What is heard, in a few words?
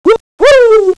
woo